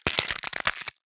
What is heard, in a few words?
bones cracking; incredible-race